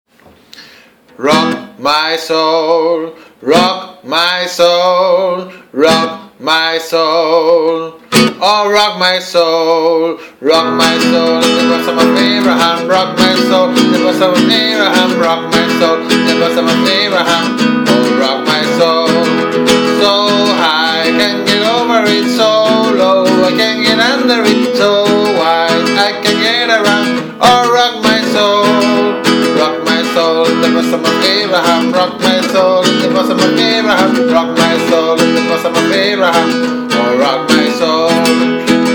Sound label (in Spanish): rock my sould bajos